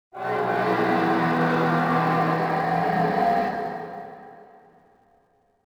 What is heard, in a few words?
metal stress, cargoliner; Soldier Of Fortune II Double Helix; XBOX; ambience